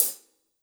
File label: Hihat Closed